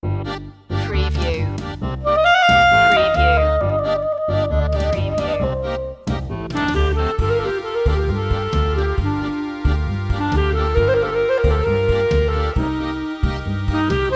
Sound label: tango